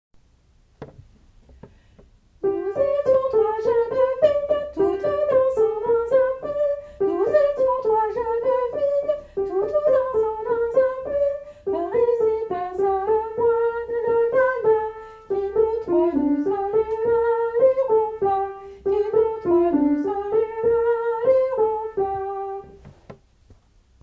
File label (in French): jeune fille soprane